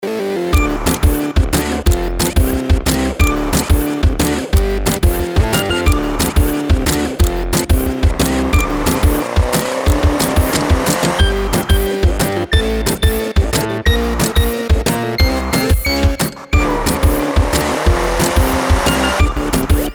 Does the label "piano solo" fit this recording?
No